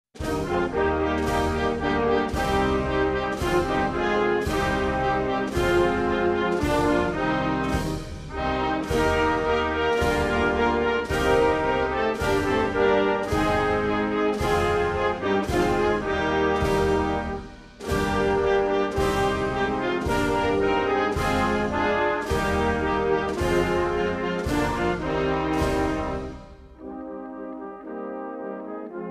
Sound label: ANTHEM India